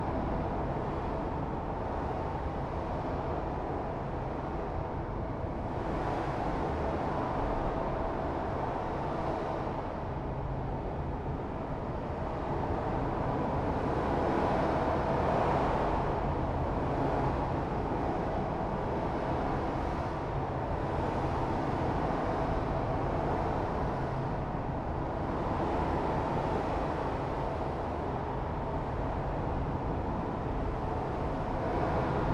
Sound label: ambience - outer space; Lego Batman 3 Beyond Gotham